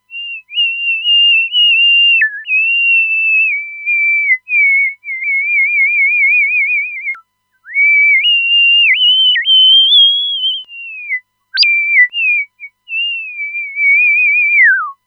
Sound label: Whistle; Zoom V3